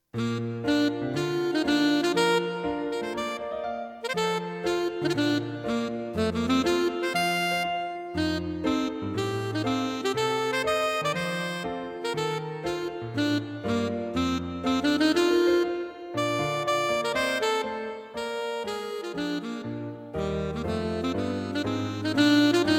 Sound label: Alto Sax